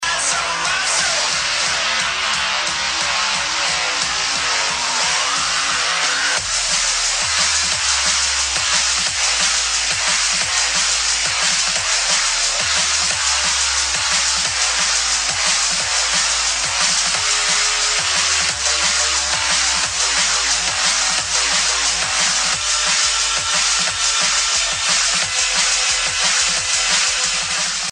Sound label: dnb, ringtones; gmod; perp2.5